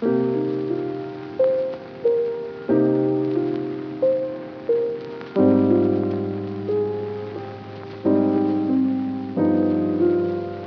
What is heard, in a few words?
Lofi Melody Loop - 90 BPM C Min Keys; Loop Stems; Cymatics; 2020 Melody Collection